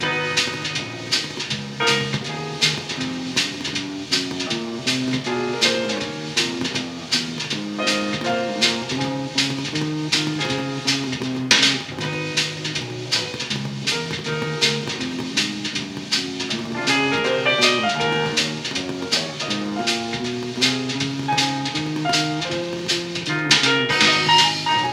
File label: old jazz record